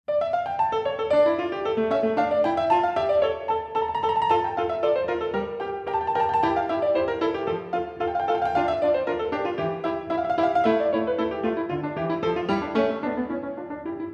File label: Sonata in D minor